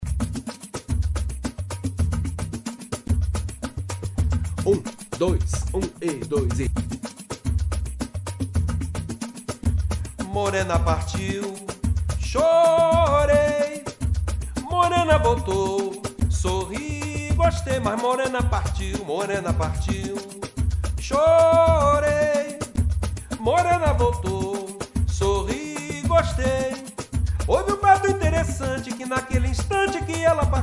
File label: Playback 110bpm